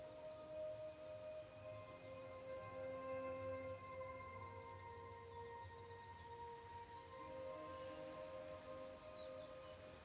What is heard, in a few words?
bg music; Ghost Recon; Breakpoint; annoying; asymmetric background music